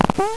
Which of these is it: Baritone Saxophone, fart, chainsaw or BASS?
fart